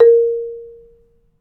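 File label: MARIMBA C6; Synclavier Percussion; World & Orchestral; ILIO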